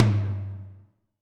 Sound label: Tom Lo Dbl, Alesis D4